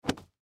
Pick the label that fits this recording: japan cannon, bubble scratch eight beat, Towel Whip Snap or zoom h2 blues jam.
Towel Whip Snap